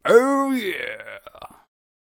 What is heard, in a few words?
Tank Attack Vocals